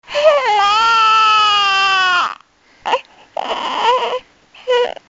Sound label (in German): Erster Schrei